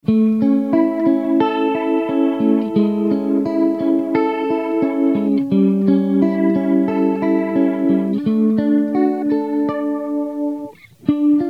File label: Dry Bubbles; Strymon Compadre → Strymon Iridium → Strymon Lex